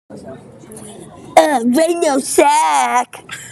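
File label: dude moan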